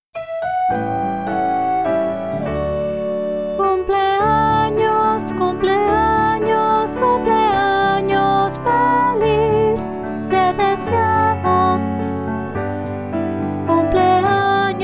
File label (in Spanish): ¡Cumpleaños feliz!, Cantos; primarios 2026